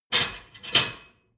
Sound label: dishwasher frame fold up